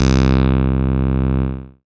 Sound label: Acid Bass, Dance Synths